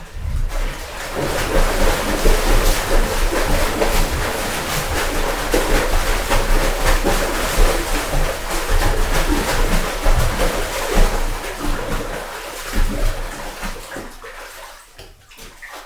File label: bathtub splash; horror